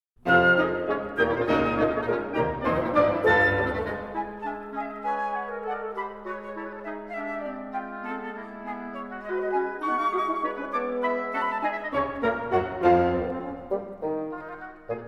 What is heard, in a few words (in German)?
Concerto fur Horn und Orchester I